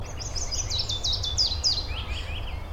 Zilpzalp; Mainsondheim KT; Rainer Jahn

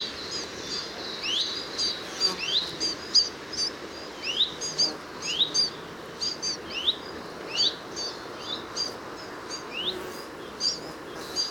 Zilpzalp; Landshut; Michle Peron

